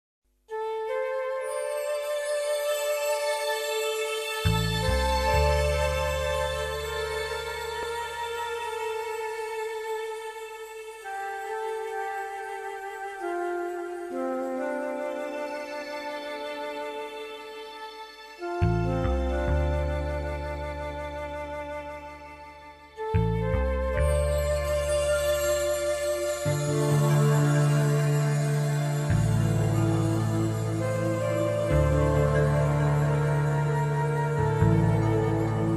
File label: Atmosphere